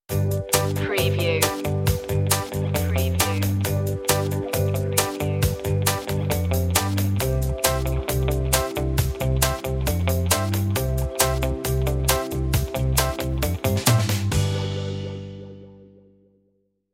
gentle beat stinger

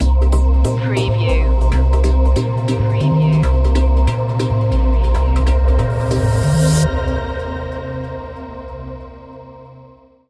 electric dreams stinger

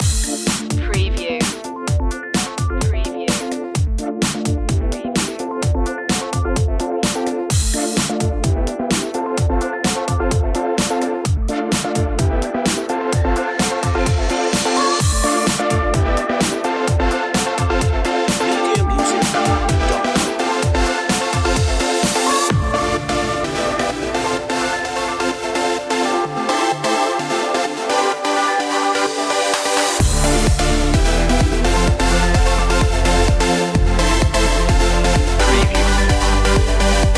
trance

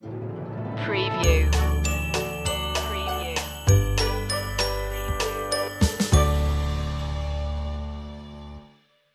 soulful christmas stinger

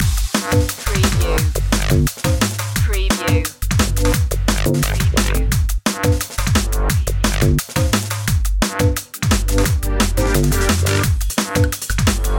danger dnb loop

